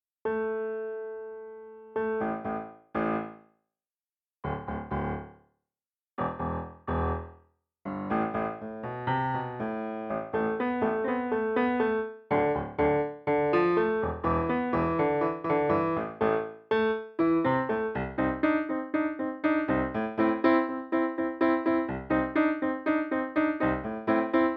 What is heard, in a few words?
Accomp; FOLK